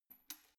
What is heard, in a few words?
letterbox metal turning key